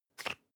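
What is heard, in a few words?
toothpaste open twist hard, Bathroom; haus-garten; badezimmer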